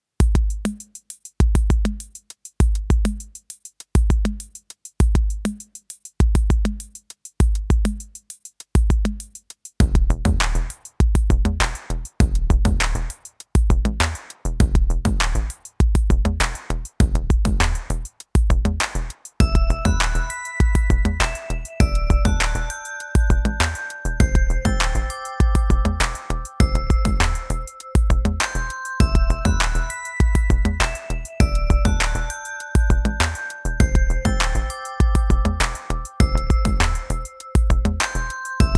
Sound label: Electronica EQed